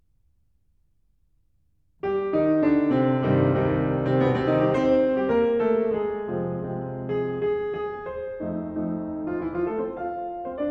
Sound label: Small Piano Pieces, Allegro con moto; Grieg; The Complete Music For Piano